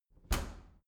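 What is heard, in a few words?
dry cleaner open hard, maschinen türen; tueren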